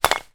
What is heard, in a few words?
foley glass empty bottles x2 in plastic bag plut down hard on ground not smash; zapsplat